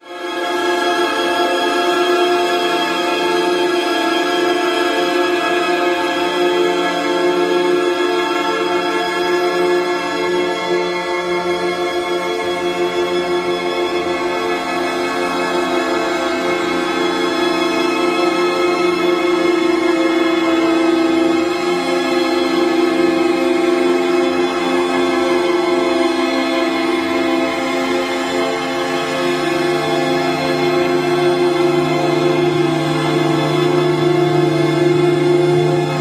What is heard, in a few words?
Tone